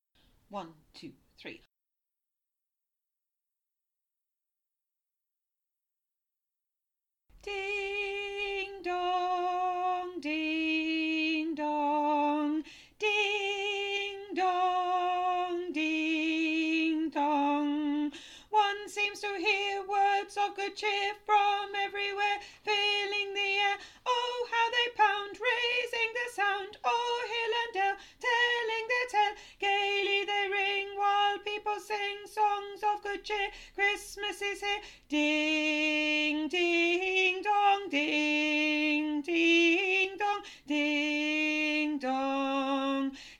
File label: CAROL OF THE BELLS ALTO